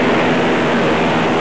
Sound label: PISTON